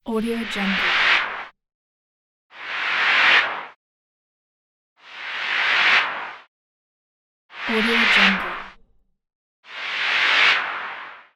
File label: long swoosh pack